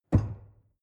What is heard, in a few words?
drawer narrow close lock